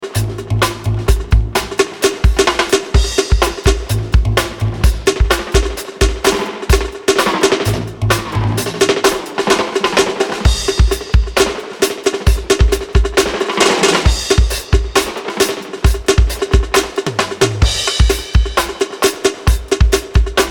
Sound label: Drum Dub